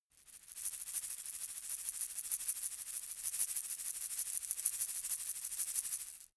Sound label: shaker plastic egg rattle soft